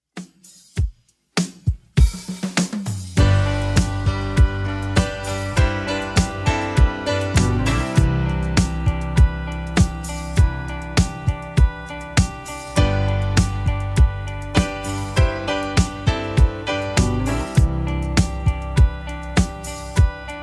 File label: 70s Redrum 100bpm